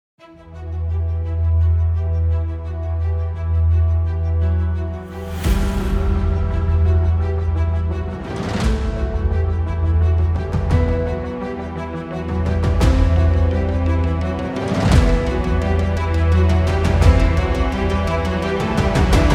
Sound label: the epic trailer